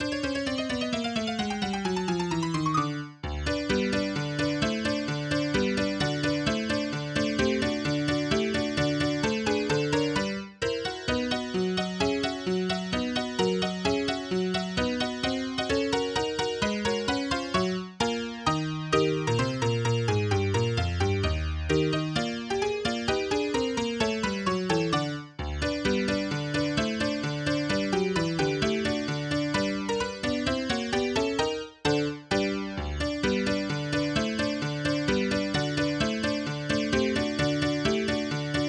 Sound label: Piano